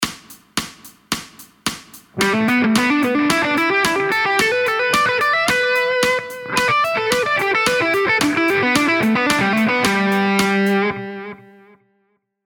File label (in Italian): Esercizio Pentatonica maggiore semicrome